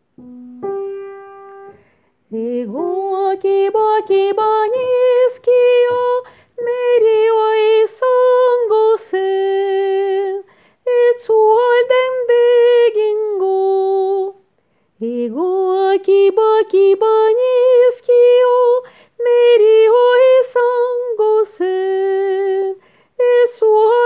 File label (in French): Soprano ligne